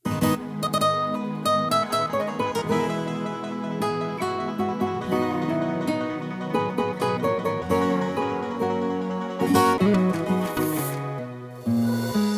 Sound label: Acapella